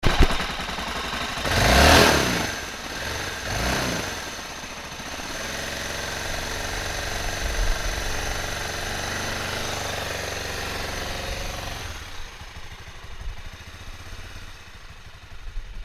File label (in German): Motorrad